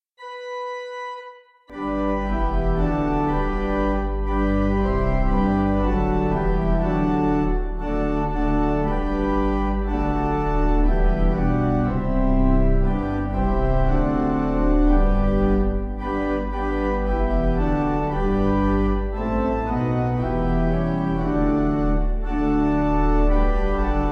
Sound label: Pipe